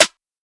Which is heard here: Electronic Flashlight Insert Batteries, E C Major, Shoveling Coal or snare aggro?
snare aggro